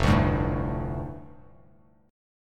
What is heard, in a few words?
F 6th Add 9th F down Bass Standard, chord; F6add9 strummed